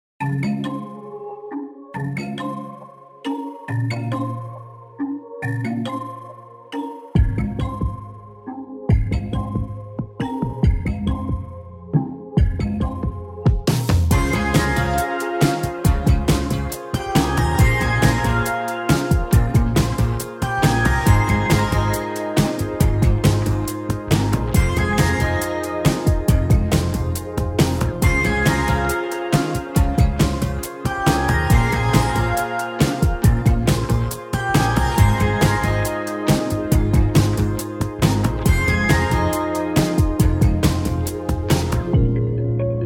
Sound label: kalimba